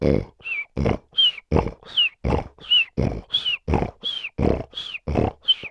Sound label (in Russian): hrap